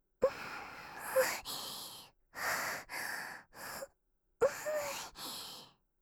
セックス吐息多め; カスタマイズ用音源

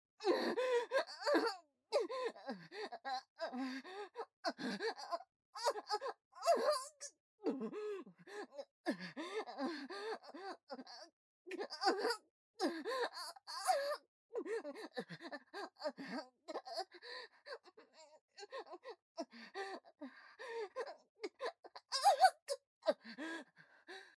顔面騎乗 喘ぎ; ヒルダ

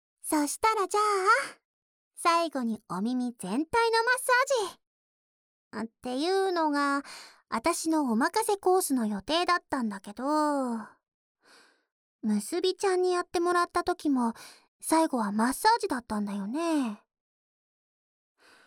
お耳全体のマッサージ, ASMR; 好きなとこた～っぷりやってあげる♪; 積極的なギャルっ娘とイチャあまた～っぷりマッサージ&耳かき

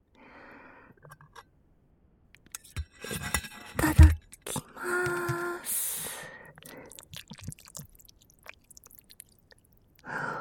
咀嚼音のみ; 食欲と性欲 咀嚼音のみ1時間

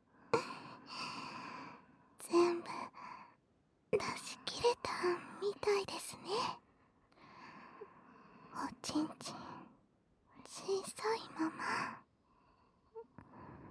安眠両耳舐めピロートーク, ASMR

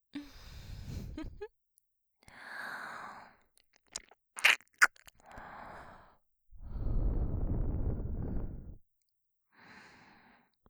キス; 【マゾハーレム】

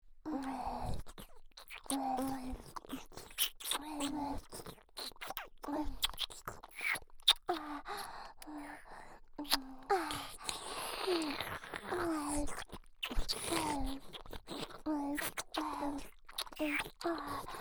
左右同時耳舐め

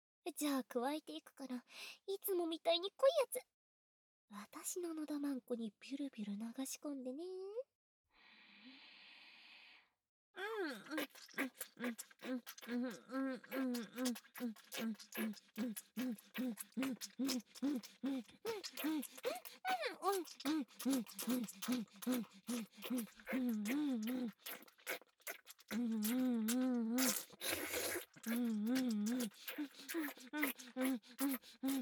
フェラループ; SEなし; 隠れビッチな尻デカ幼馴染; 図書室の隅っこ

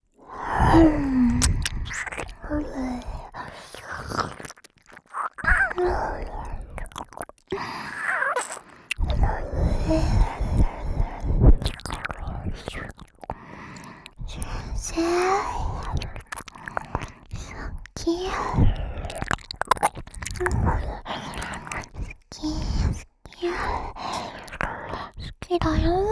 耳舐め; カレン; KU100

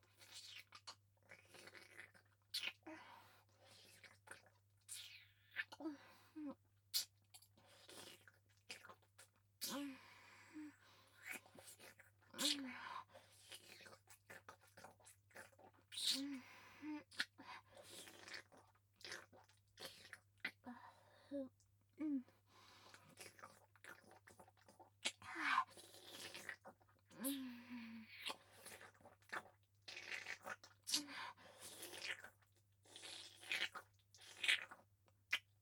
フェラ 普通

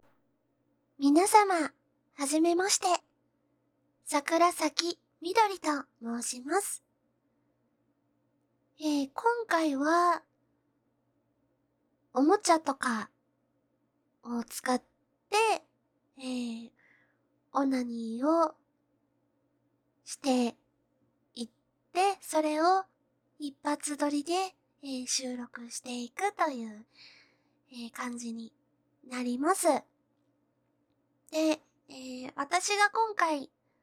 実演; ノイズ除去; 大人のオモチャ; 細グラマーFカップちゃん

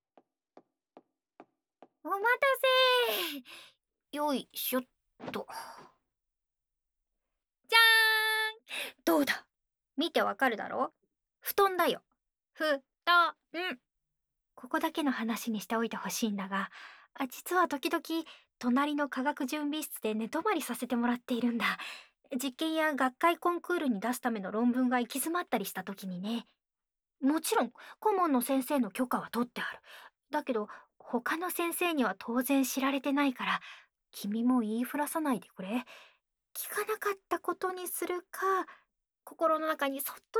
吐息、寝息 ささやき; 『私の吐息で癒されてみるかい？（吐息、寝息／ささやき）』; 理系JK

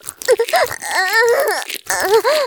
絶頂, ASMR; 騎乗位

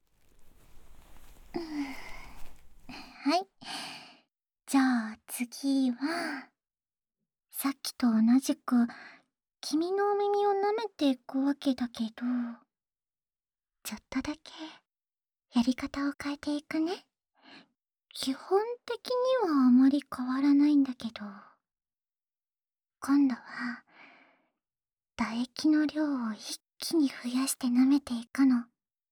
唾液いっぱいの耳舐め; 私の唾液の感触で……理性溶かしてみよう？; 青春べろちゅー委員会

